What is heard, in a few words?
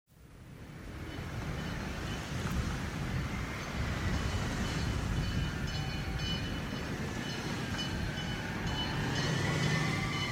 Fire Scene; SOUND EFFECTS1